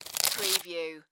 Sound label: Cracker Remove Package